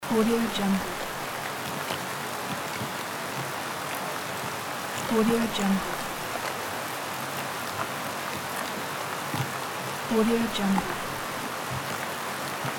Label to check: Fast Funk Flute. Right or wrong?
Wrong